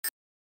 Button Click; bob the robber to go6

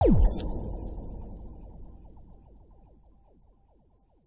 gameover; dot adventure

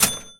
Ching; johnny upgrade

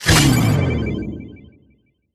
upgrades; pandemic simulator

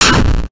death; slime switch

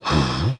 Huh; johnny upgrade

